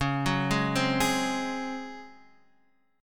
C sharp Major 7th Suspended 4th, Guitar, Half Step Down